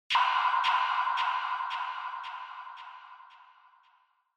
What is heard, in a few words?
Single Accent Delay